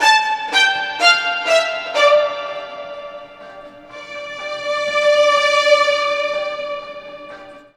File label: TAR STRG -L, Roots Disco; USB Soundscan vol.32; AKAI